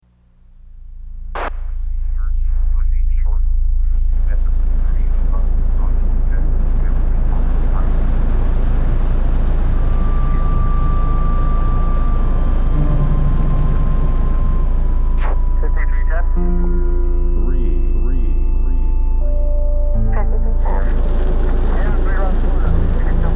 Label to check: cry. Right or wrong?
Wrong